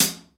Closed Hat; Bonzo

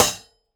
snare one shot crack attack

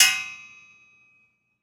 percussion metal hit

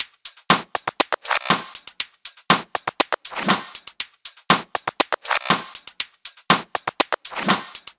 Percussion Loop, 120

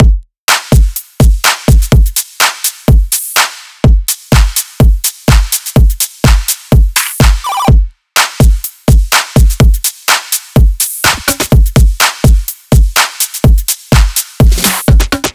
Drum 125

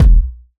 Kick

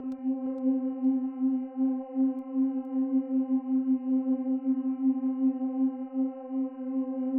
SYNTH

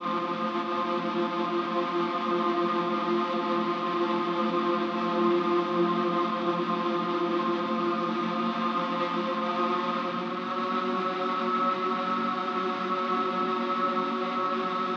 ATMOSPHERE 175; KULTURE

